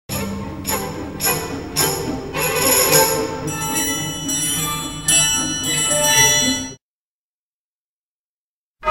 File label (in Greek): Ταμπουρίνο τρίγωνο; Η οικογένεια των κρουστών